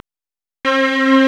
STRNG; CMP - Analogon

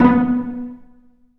Cel Pz B1; Digital Sound Factory Virtuoso

